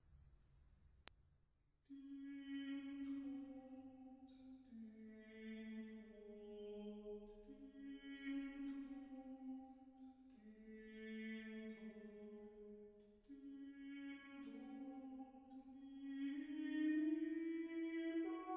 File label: Chorale for 4 voices, single voice; 'Christ lag in Todesbanden'; BWV 278